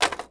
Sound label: blaster drop creak; objects; Star Wars Obi-Wan; XBOX